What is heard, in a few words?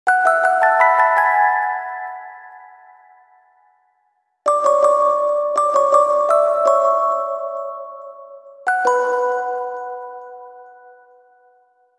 Music Box; Grimoire Song; Rune Factory Frontier